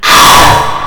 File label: Scream